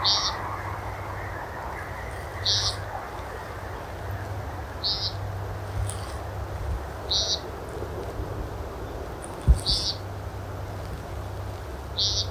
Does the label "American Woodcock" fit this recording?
Yes